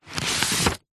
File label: office file folder wallet card paper sheet document slide in or out